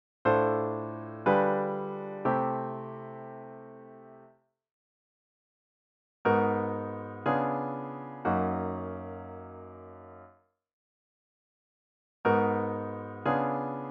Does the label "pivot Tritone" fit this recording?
Yes